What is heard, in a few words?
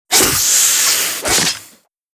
Snake rapid strike attack